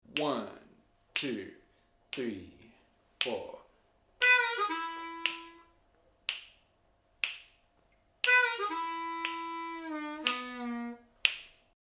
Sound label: Lick Slow, harmonica